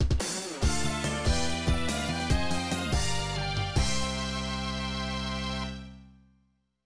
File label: Clear Version Stage Clear; TwinBee Yahho! Fushigi no Kuni de Ooabare; Konami GX; arcade